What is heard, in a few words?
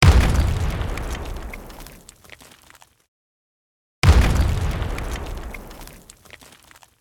Bassy Explosion Sound